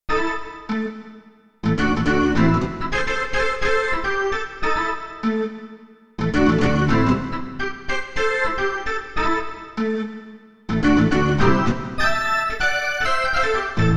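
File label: Click Leslie, Roland D-70; Factory Performances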